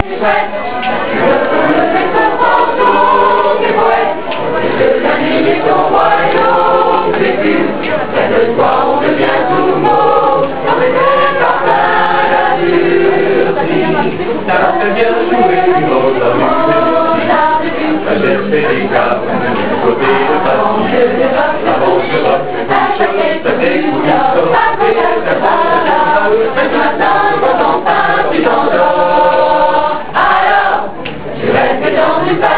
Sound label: Jazz